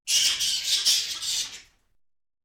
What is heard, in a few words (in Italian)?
pipistrello; Inquisitor 3